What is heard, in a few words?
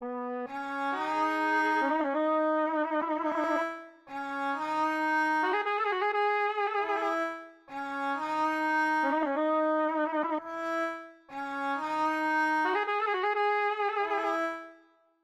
133 BPM G# Min Wind; World; Cymatics; Bazaar